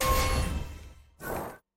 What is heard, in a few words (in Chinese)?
战斗开始组合横幅音效; nba; 战中